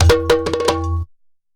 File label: SABARO ROLLS; Best Service; World Colours